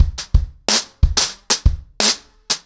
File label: 90BPM, ACOUSTIC DRUMS